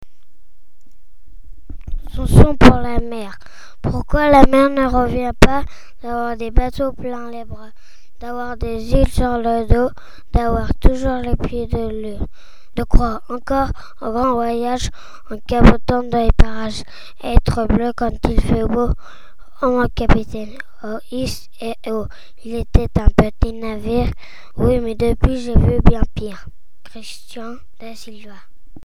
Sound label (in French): chanson pour la mer